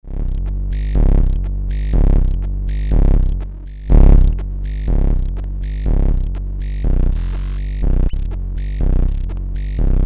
Landscape Noon Bass Sequenz

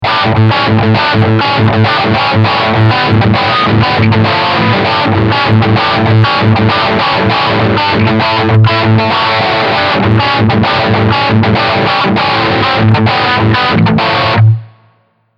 Riff Overdrive Steghumbucke

